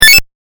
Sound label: game effect